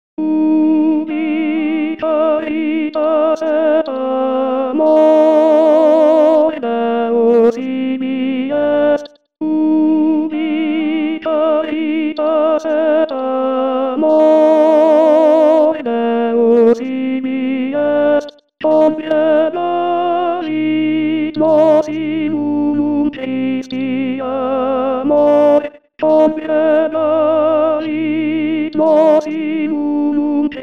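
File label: tenor 1; Durufle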